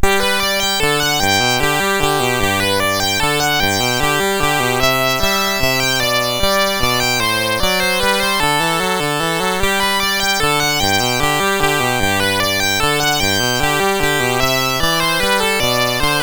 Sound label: Minuet in G; MOS6581R4